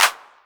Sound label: 808 Clap; Novation Drumstation